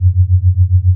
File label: leap strike gun change fly idle